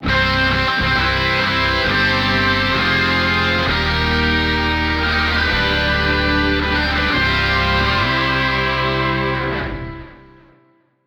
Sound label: Cup Victory; mario strikers football battle league